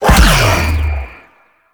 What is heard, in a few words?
Whirlwind Dash Empowered Impact A; Warframe; Warframes; Caliban